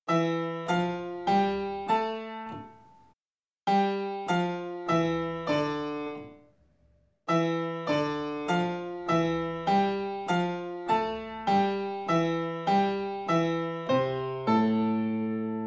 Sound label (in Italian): per gradi congiunti e salti